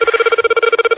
lofi communications, Alarm Sounds

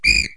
police whistle; Western and Latin Percussion; single hits

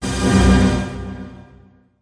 push that synth chord

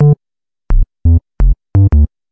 086 computer bass, BASS LOOPS

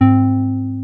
mello mid analog; Basses

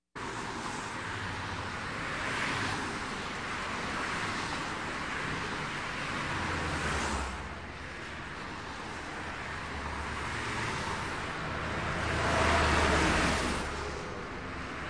highway; Sound FX Real Life

